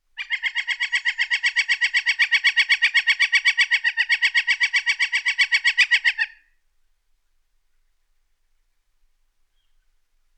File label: Flicker northern; birdsong